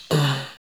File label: Puppy Talk; Spectrasonics - Supreme Beats - World Dance; Puppy Ratchet